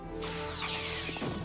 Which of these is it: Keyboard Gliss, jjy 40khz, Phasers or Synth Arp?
Phasers